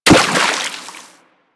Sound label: Run Water, Footsteps; Warframe; Operator